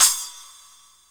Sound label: SPLASH, CYMBALS; Back In Time Records - Big Bang